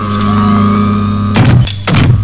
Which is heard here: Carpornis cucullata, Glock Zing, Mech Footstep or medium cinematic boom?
Mech Footstep